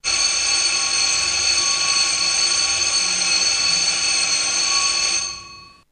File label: bell